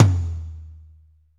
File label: tom, Pop Country, Wet; Northstar; Drumscapes Roland